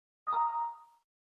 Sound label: windows 7 error sound effect